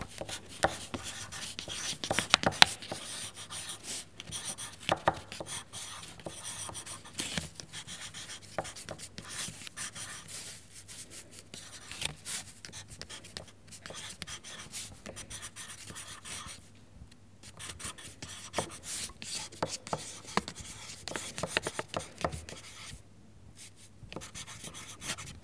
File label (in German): Schreiben